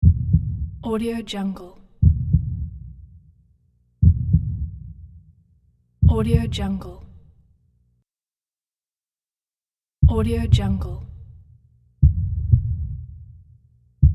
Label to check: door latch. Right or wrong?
Wrong